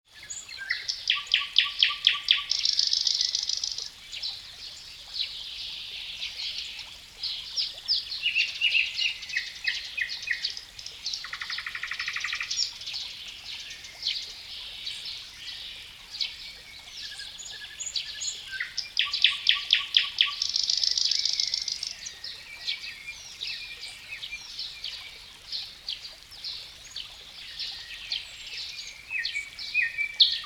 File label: Natural Woodland Forest Water Stream Nightingale Birds Chirping Singing